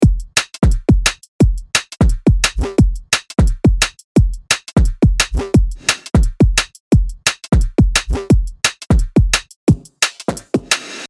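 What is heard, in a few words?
174 drum loop, Drum & Bass; Tech Flavours; Drum & Bass Sample Pack inspired by the sub-genre of Tech Step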